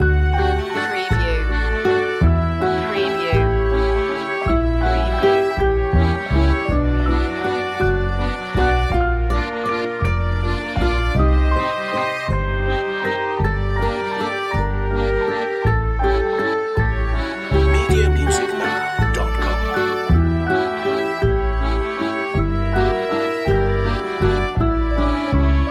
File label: paris carnival loop